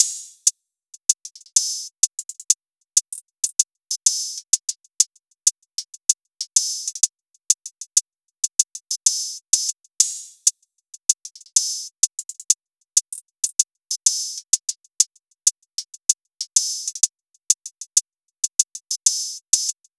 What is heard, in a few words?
Hihat Loop - 96 BPM; Drum Loops